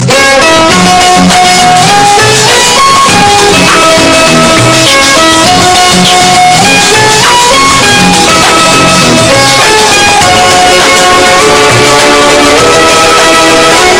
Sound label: Simple Tune